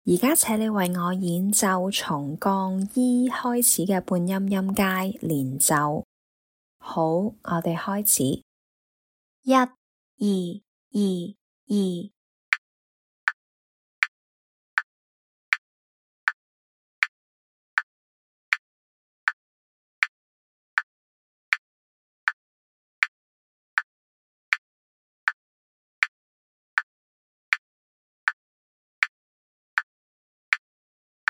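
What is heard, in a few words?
chromatic scale starting E flat legato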